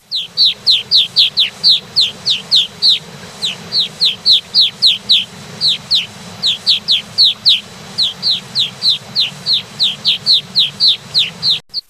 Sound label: Brown capped Rosy Finch